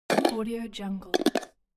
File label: metalic cap; crisstyna